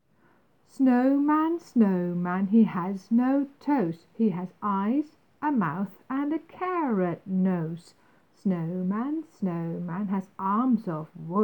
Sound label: chant snowman